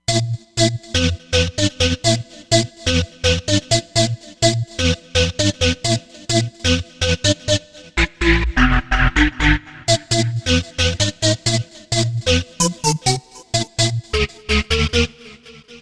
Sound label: Dance Steam, Roland Fantom XR